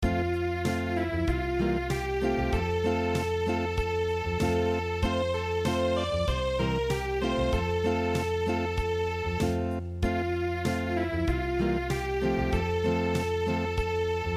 Unplugged, midi; Meerstern ich Dich grusse

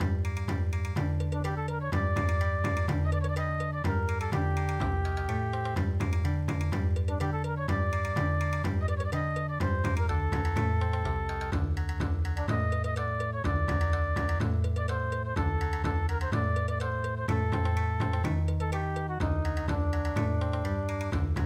Bon Odori

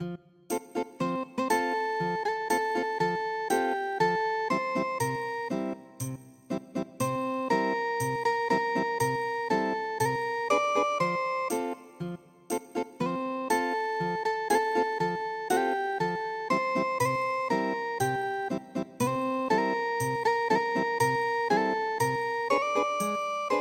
Ethnic Guitar

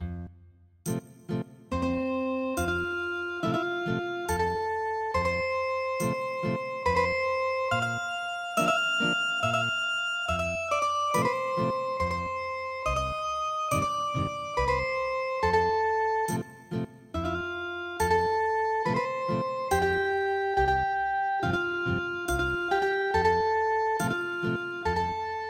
Ethnic Guitar, traditional

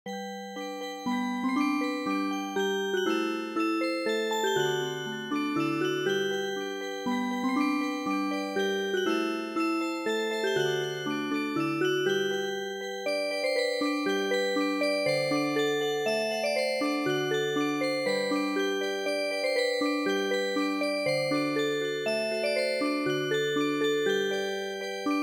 Music Box; Emmentaler Lied

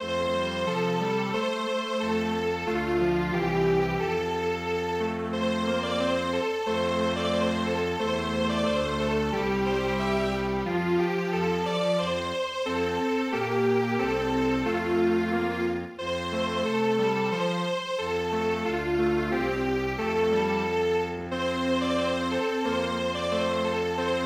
Strings Quartet

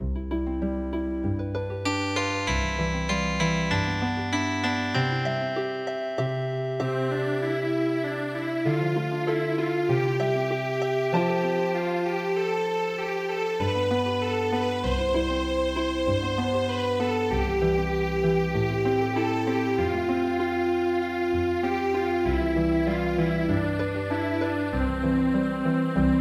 migratory bird Healing Harp

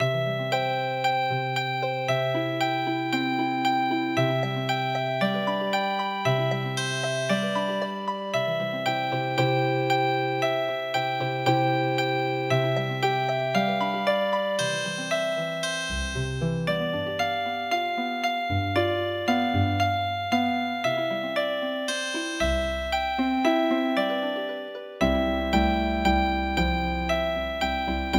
wooden soldier Healing Harp

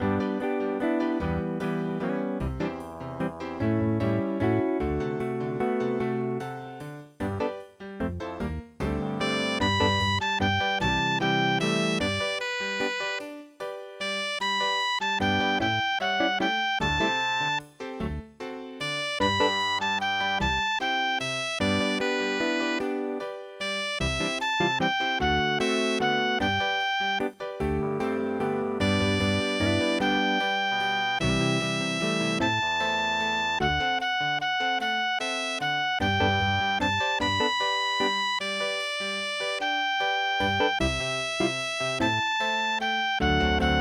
jazz waltz; my bonnie

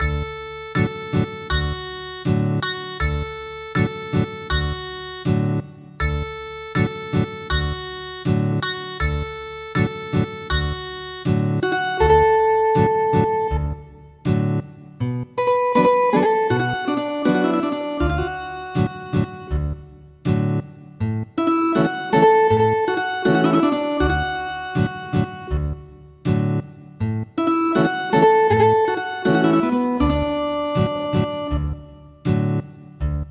Ethnic Guitar; midi; traditional

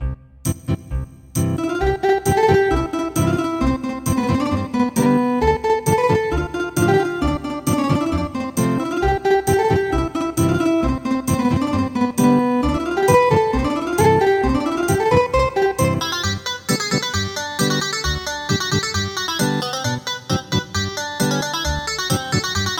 Schottish Ethnic Guitar; midi; traditional

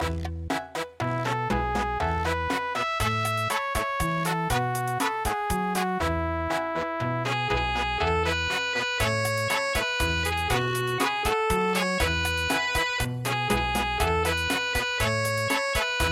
Cha Cha Cha; aogeba

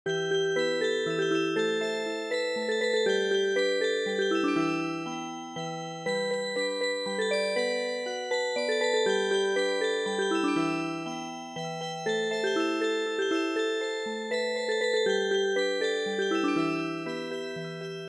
chonan samugori Music Box

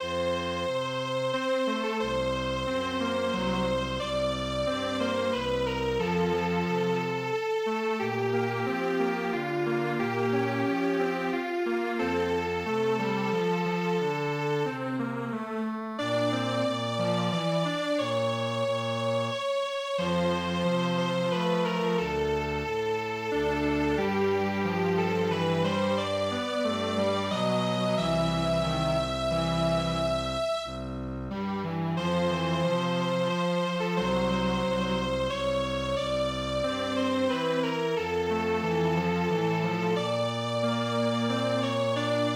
Strings Quartet

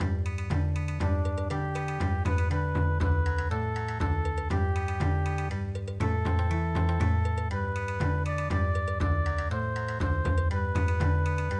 Bon Odori, traditional; Durchs Wiesetal Gang I Jetz Na

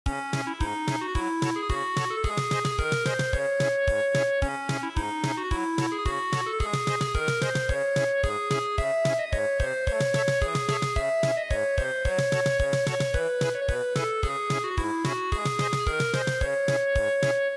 German Polka, traditional; The Frost

